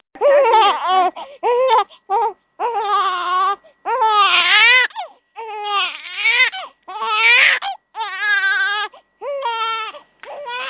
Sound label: Baby crying